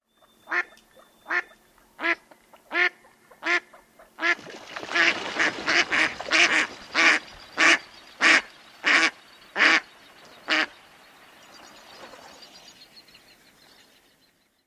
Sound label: female quacks